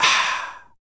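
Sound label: vox percussion, Vocal Planet Jazz FX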